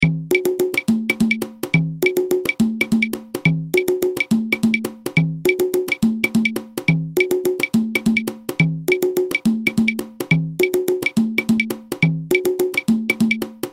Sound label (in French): guarapachangueo cajon clave